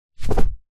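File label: household pillow bamboo drop carpet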